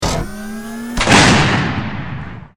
battlesuit pulsegun; combat

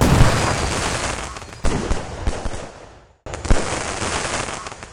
crackle, firework

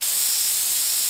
spray, fire

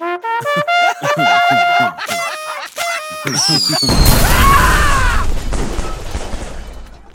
invade; CosmicRage; CosmicRageSounds

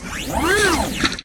helm off; CosmicRage

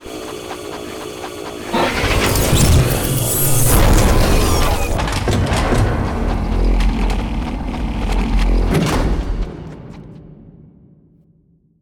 land; moonbase

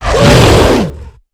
hit, enemy combat

